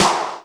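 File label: Snare Lo Pop Shot, Alesis D4 drum machine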